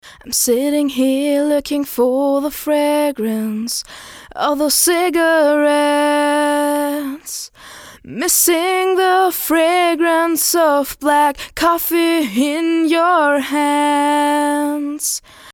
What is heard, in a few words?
tlm 103 - voc - eq comp